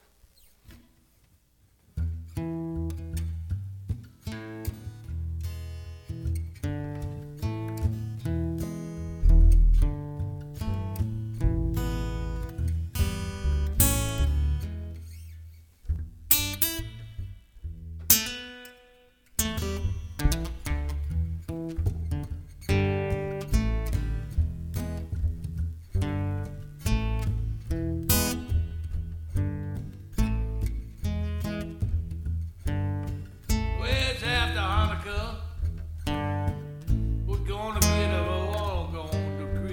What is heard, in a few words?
Jam